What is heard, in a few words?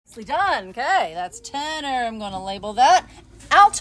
Mean Tenor, vocaltracks; Mean Girls; Rehearsal Recordings